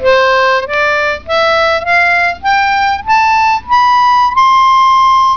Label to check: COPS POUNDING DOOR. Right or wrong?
Wrong